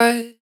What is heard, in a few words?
Dry One Shot - A#, Vocal; Tonal One Shots; Cymatics; Euphoria Vocal Sample Pack